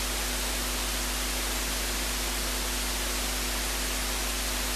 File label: hiss